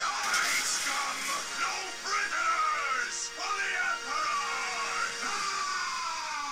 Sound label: Warcry; Blood Marine